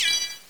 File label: new button; Simple Faro